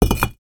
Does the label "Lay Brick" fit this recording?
Yes